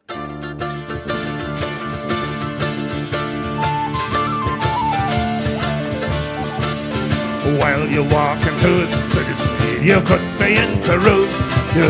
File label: irish pub song